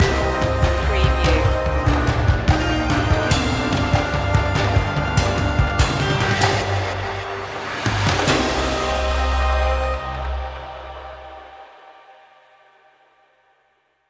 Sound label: dark hero action